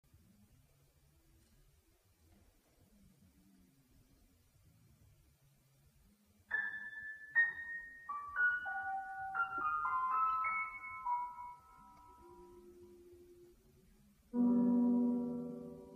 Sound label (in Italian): Sinfonia Da Camera